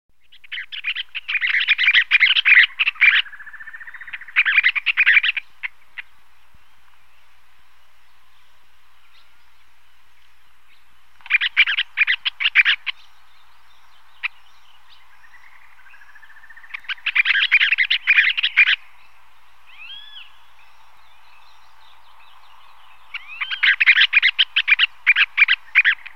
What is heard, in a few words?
fluvicola nengeta lavadeira marcarada; fauna